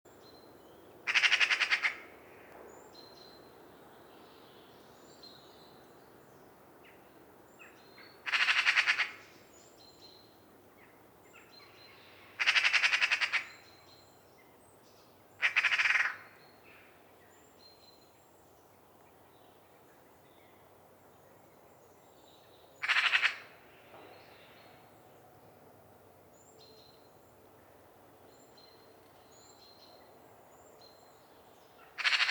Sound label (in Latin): Pica pica; SETE